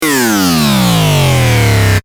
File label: decay